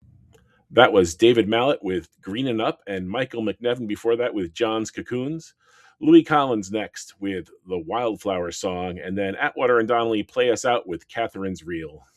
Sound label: Break